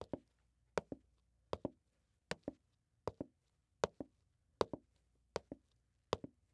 Foley Footstep High Heel Tennis Shoes Concrete Walking; Foley Footsteps